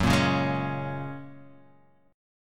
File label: F sharp Major Add 9th, Guitar Half Step Down